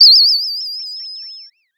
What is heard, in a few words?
Sound of a bird tweeting nicely